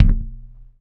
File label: glass, footsteps; player; Dystopia